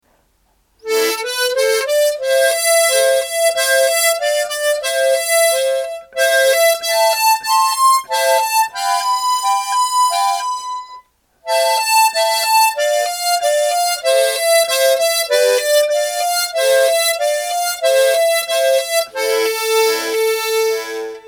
natural minor; akai

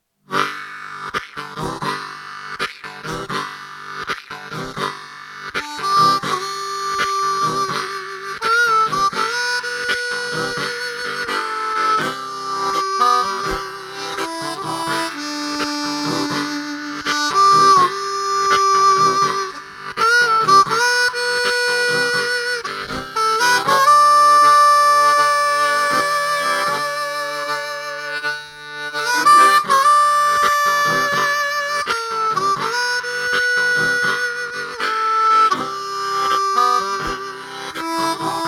low key; manji

